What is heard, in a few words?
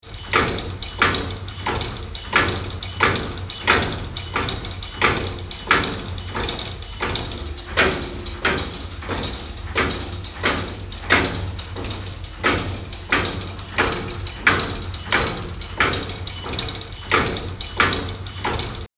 Machine room ambience machinery movement loop